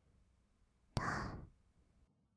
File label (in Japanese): た, ブレス, 吐く音, kawaii voice; ASMR; 1200 kawaii voice!!